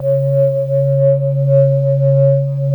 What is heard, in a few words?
PAD pad; Best Service Gigapack 1